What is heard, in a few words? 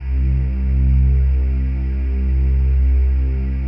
PAD, DIGI-PAD